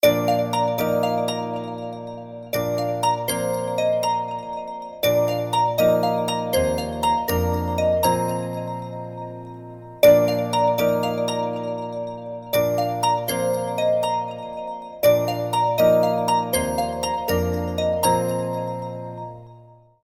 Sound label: Crystal Clear, ringtones; Tecno Spark Go 2022